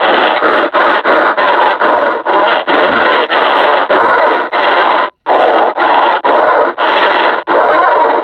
panting, suppressor

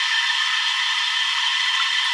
steam loop, ambient tones

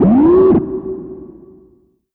vox swing, dog; npc; scripted

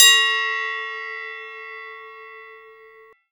bell normal, training; Counter Strike Model Pack; CS:GO

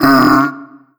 pain, barnacle; Half Life Alyx

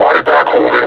injured, suppressor

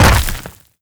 land, footsteps, gravel; player

